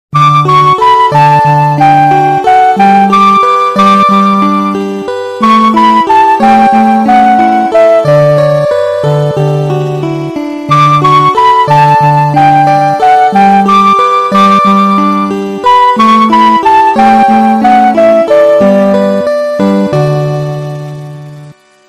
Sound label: school bell; singapore